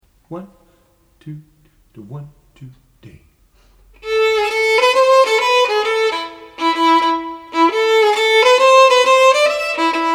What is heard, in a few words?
Jazz Prelude